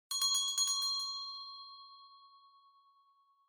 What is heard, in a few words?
Winning Bell Gentle